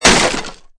barrel break

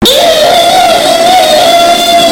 bass boosted ehhehe

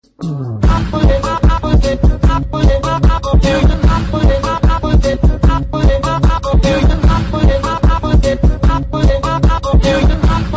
dreamy crystal ball

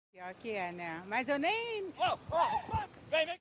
beep

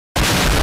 nuclear explosion sound

